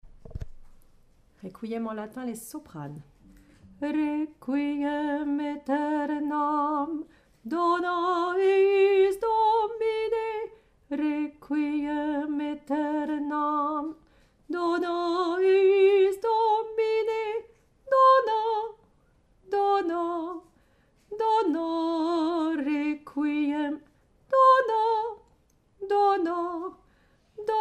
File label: Soprano; ouolof; So Vocal